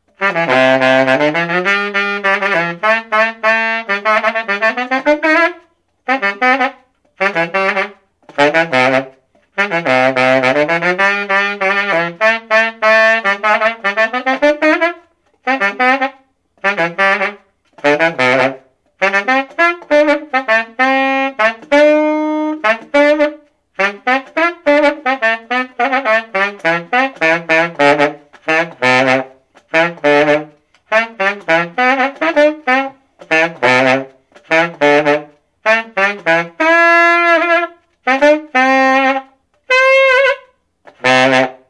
Conn C Straight Gold Dixie C key, Sax

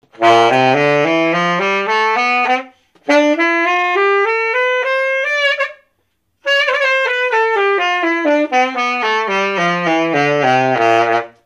Martin C Typewriter Scale, Sax

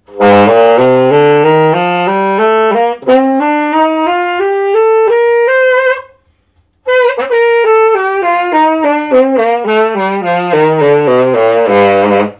Conn Wurlitzer Tenor Silver Cannon Scale

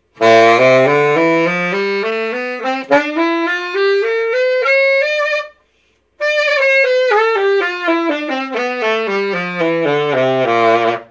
Buescher Gold C Mel Scale, Sax

